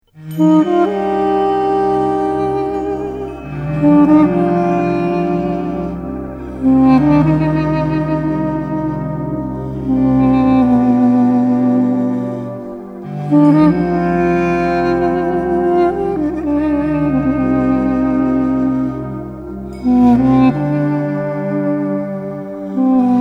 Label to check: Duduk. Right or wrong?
Right